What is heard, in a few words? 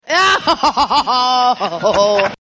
Crazy Laugh